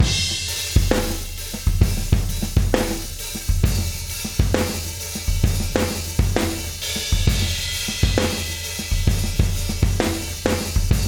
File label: Rock Verse with Cymbals